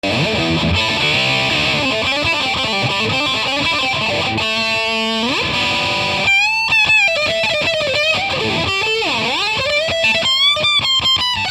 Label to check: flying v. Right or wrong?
Right